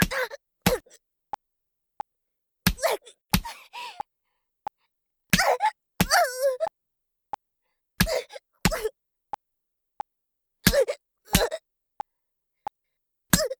ジャブメト BPM90 ワンツー, メトロノームあり

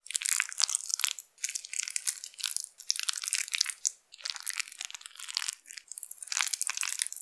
ゆっくりストローク ループ, ASMR; ピストン運動

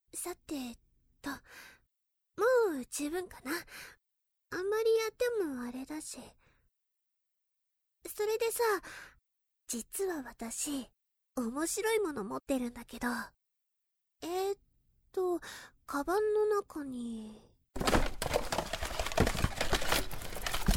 耳のオイルマッサージ(両耳)

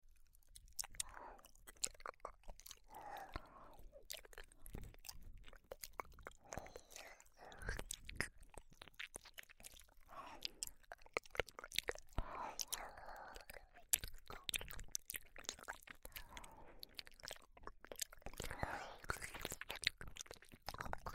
両耳舐め, ASMR; ブルマちゃん

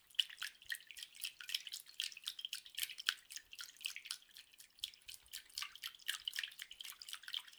ストローク 弱中, 水音効果音

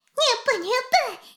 シコシコ音声; 古き城のドラゴニート2

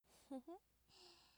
笑い声; ループボイス

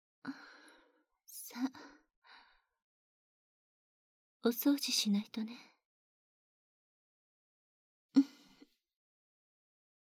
姉さんの溺愛お掃除フェラ, ASMR

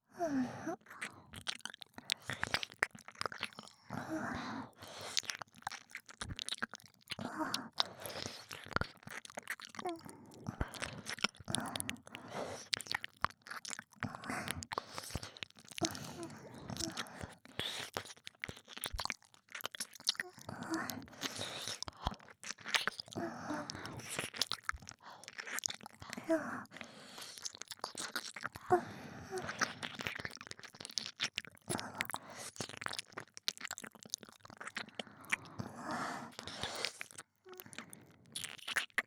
左 右 耳舐め, ループ用耳舐め; 左あさひ×右まひる

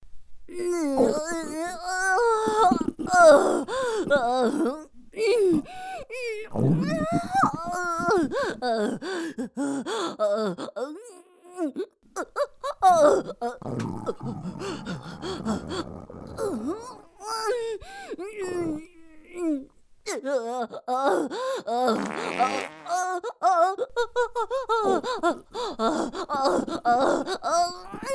踏ん張り喘ぎ声 おしっこなし

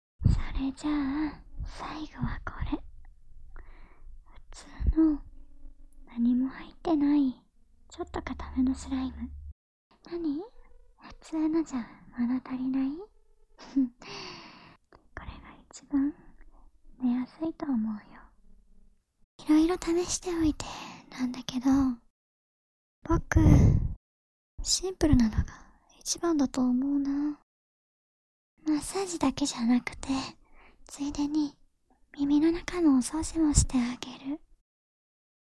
ふつうのスライム, ASMR

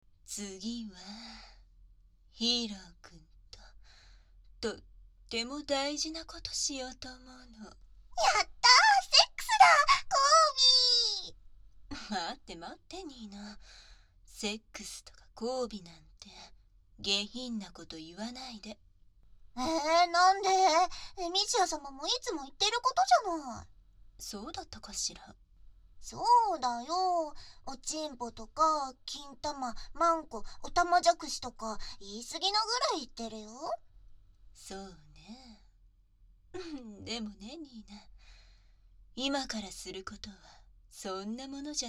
かわりばんこディープキス; 洗脳

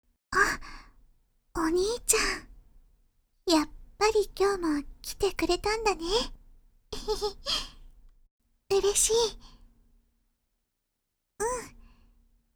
妹と寝る前のキス

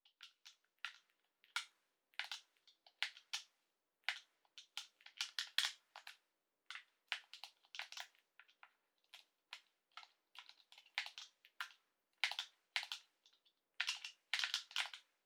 ゲーム の コントローラー を 操作

